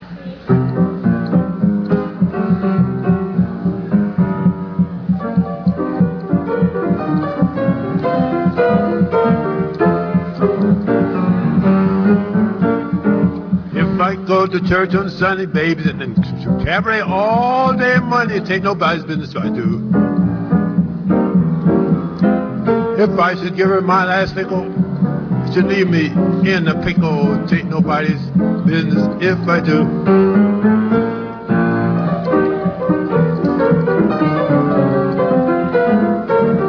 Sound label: piano